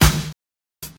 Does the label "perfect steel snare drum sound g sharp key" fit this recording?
Yes